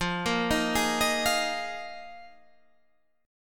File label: Bb 7th Suspended 4th F